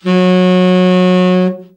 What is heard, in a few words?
TEN SFT, SAX; Best Service Gigapack 1; BR SAX 3